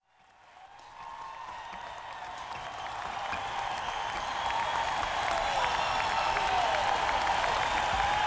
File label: Tuning